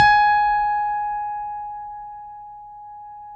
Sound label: G#5 FF, Piano cp80